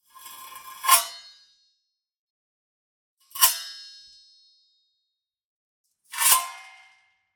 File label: Just Metal Scrapes and Scratches; soundbits